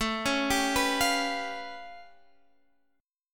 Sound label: A Augmented 9th A